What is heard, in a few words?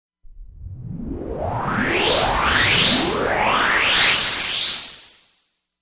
Sweep Energy; Yamaha SMAF demo ringtones; SMAF Entertainment